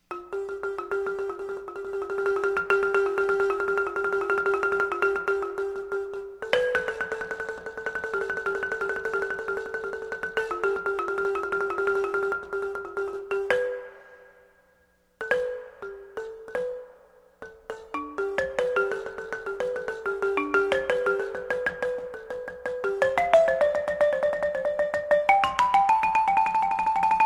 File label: Balafon